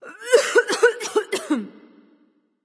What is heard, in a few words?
clear throat; smite; sol